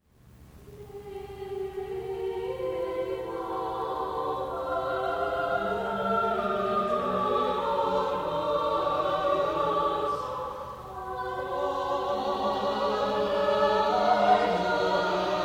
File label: MEDITATION; Newman